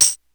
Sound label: tambourine